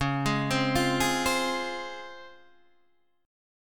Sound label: Db Major 7th Db down Guitar DADGAD